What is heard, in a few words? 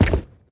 footstep; smite; olympus